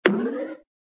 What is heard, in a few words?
bilp; cartoon; hannah barbera cartoon sound fx